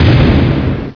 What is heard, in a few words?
bang huge; yoda; PC Gamer 44